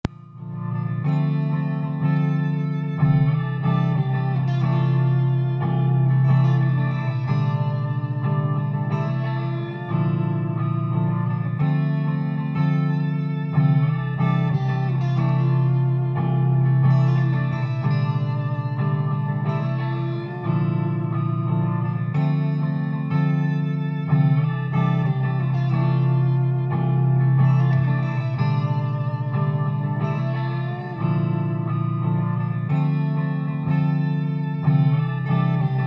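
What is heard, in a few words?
Relaxing clean with gain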